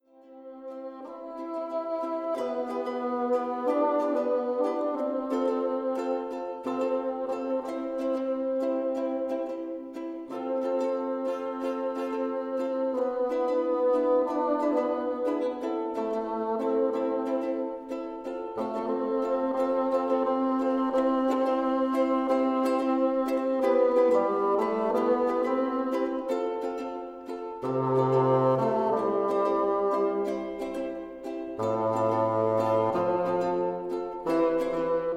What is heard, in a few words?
Ukulele